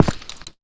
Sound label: step; svencoop; AoMDC